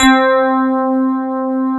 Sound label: FM ELP C3 L, Keyboards Old School; USB Soundscan vol.09; AKAI; FM ELP 6